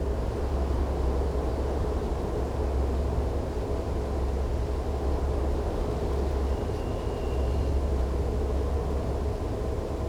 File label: Wind Bird, ambience